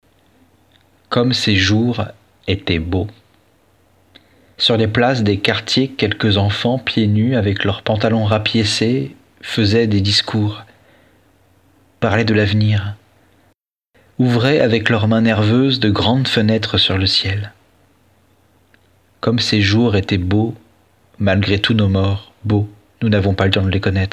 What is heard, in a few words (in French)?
Vieille mazurka